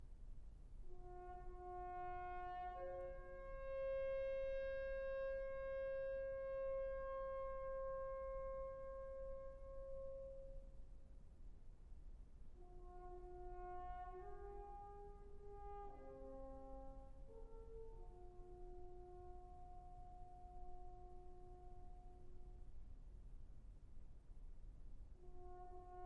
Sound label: Serenade for Horn, Tenor & Strings, Epilogue